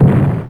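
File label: medium explosion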